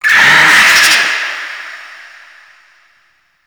Infested Scare Vocal; Gameplay; Warframe; Corpus Infested

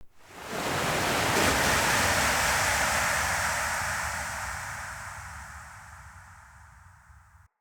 Eternium Wave Crash; Ambience; Eternium Garden

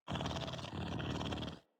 Purr; Catbrow; Pet Ship

